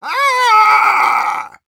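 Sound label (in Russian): Engineer pain critical death, ru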